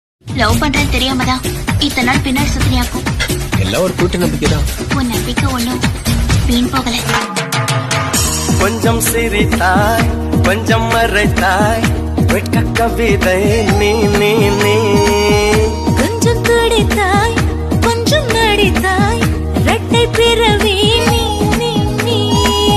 tamil love songs tamil melody songs tamil old love songs tamil 90s love songs